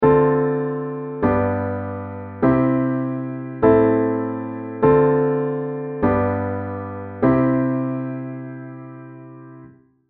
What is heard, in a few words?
minichord